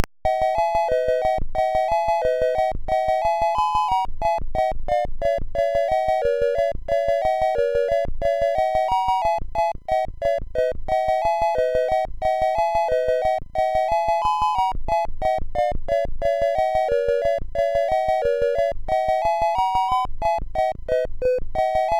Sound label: Duck Shoot